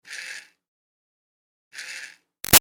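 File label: Toy Fairy Wand Medium Far Revs Short; Miscellaneous Toy Sound Effects; Toy Fairy Wand 1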